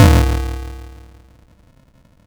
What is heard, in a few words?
Crushed Perc, bitcrushed; musicradar